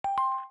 charging; Xiaomi Redmi 2; ui

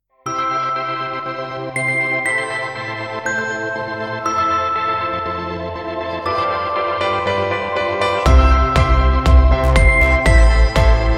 Ringtone; Oppo Ulike-U701

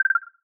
Tones SHARP; Pantech; Discover P9090; keytone

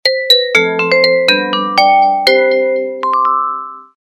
Message Alarm, notification; SK telesys; SK-S100

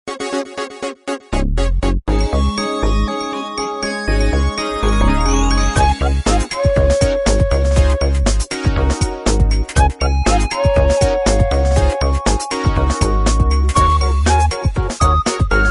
ringtong; Gionee V185

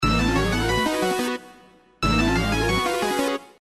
Ring Tone

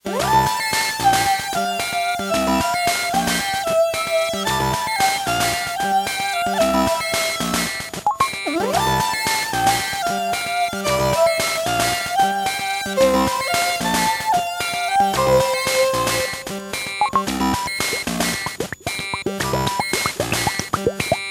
Synth Pop Goodness, Windows Mobile ringtones; Microsoft

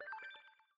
Usb

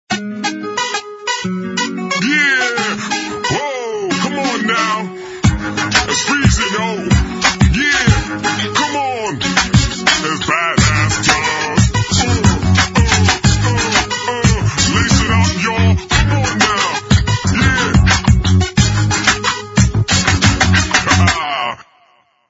Hip hop

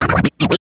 Turntablism; Nokia; 3220b

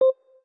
volume key down; Rollable LM R910N; ui; LG

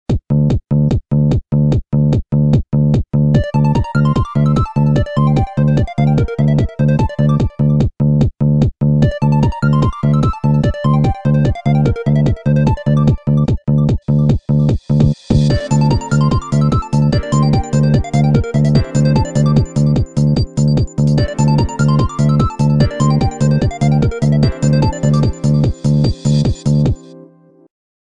Nokia Tones; Windows Phone apps

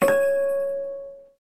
open, folder; T390K Wine 3G; LG

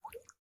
colour droplet unlock, ui; Samsung; Galaxy Note 5

